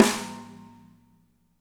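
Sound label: snare left; Spectrasonics; Burning Grooves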